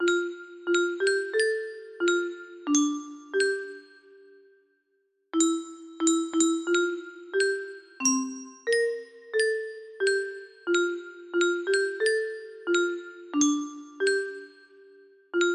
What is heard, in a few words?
violoncello